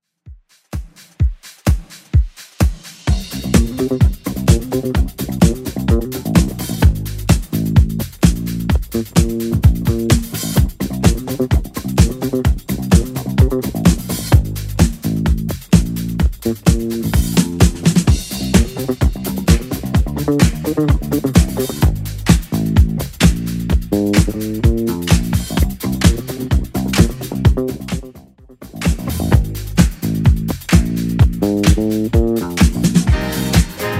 Dance 70s 128bpm; Redrum

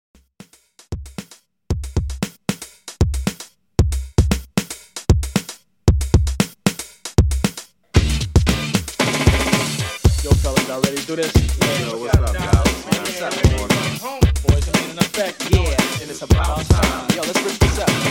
90s Funk 115Bpm; Redrum

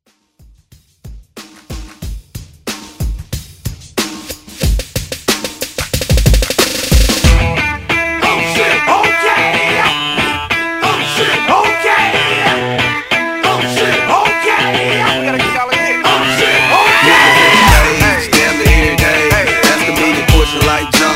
Party Break

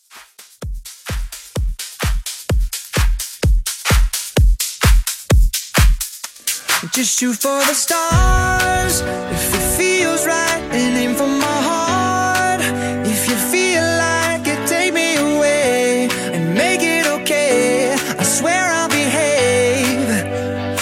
Dance House Mashup 128

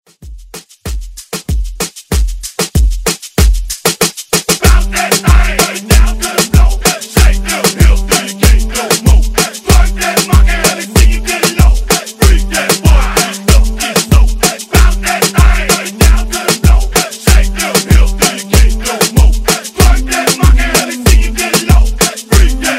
Afro Moombah; Redrum

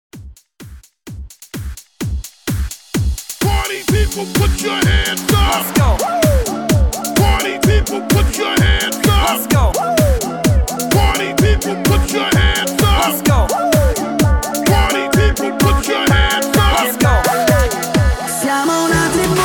128 BPM

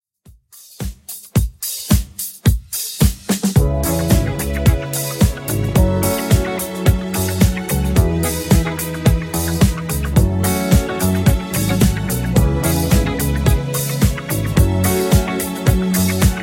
70s 109bpm; Xtend Edit

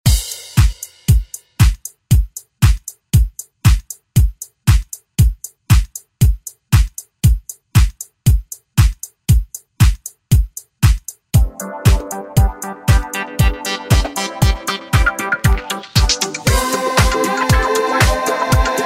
ReDrum; DJ Mhark

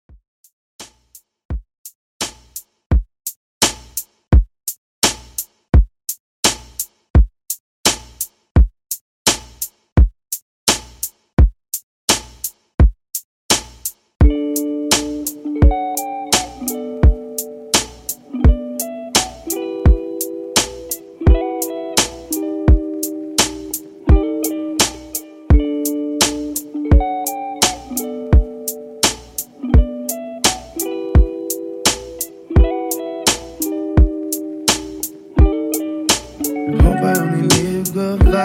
Top40 Redrum 85 Bpm

